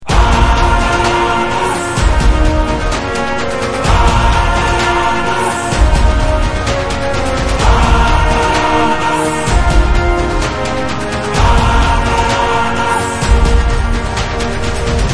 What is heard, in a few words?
Karaoke